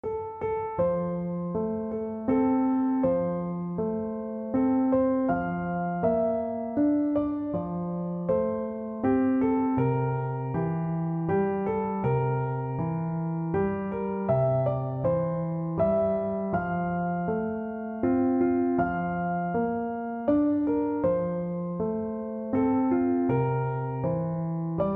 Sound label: Classical